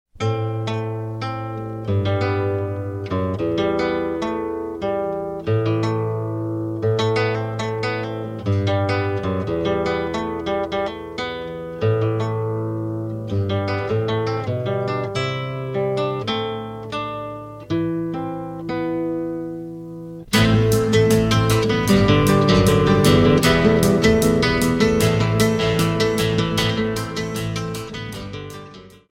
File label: classical